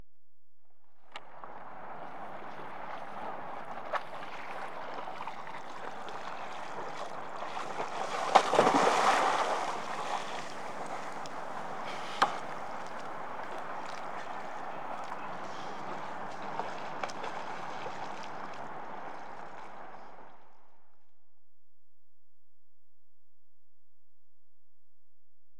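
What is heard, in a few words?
Capsize (Recorded From Nearby Boat); BBC Sound Effects Library; Adventure Sports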